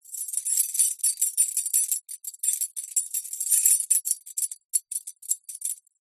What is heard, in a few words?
household keys bunch movement; zapsplat